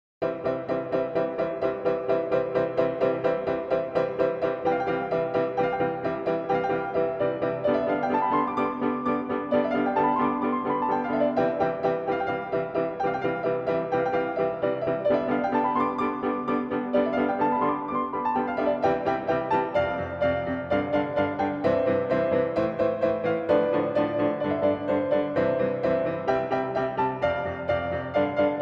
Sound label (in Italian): minuetto